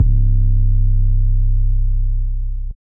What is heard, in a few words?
plugnb 808; drumkits; luger; fl studio; maily plugnb